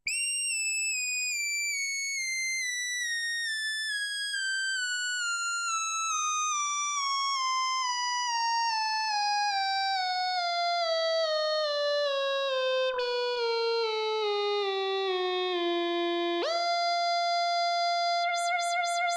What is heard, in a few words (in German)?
roland sh 2000 a tonleiter